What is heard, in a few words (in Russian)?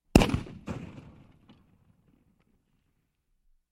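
выстрел из пушки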